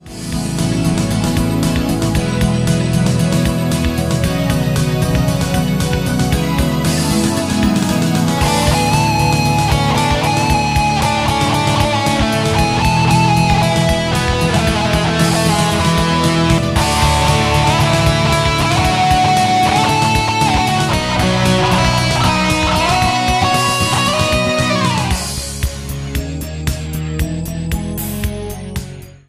solo cover

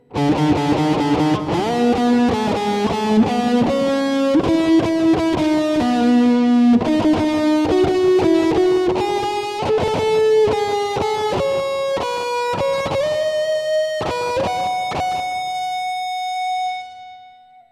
solo metalzone

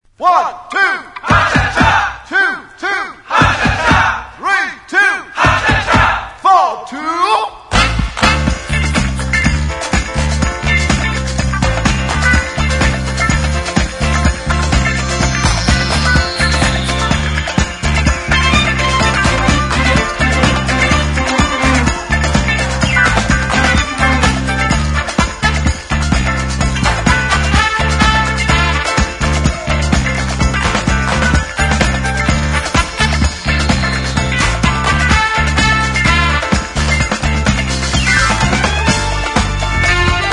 brass

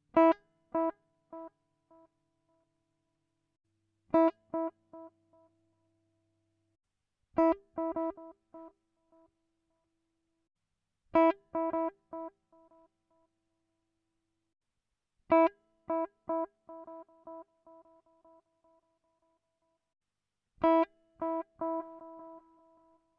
Delay Test

